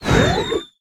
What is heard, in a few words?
Death Spin; smite; kumbhakarna